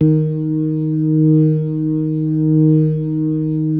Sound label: EB3S, B3